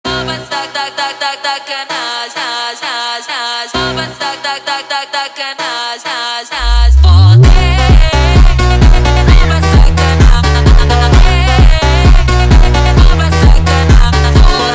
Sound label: funk